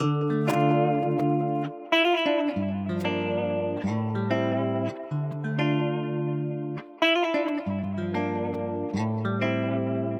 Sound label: 188 Dm LIVE dirty electric guitar